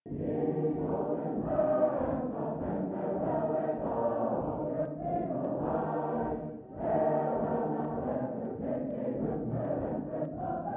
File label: bass, sacred harp singing